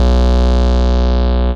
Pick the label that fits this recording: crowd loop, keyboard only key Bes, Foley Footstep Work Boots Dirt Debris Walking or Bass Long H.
Bass Long H